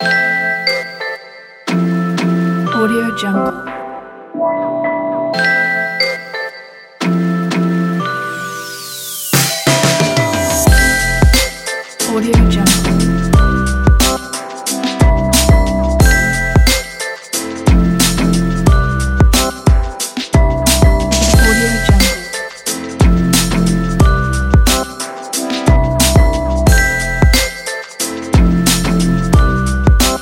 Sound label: the hip hop